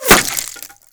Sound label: impact wood; weapons; Modern Warfare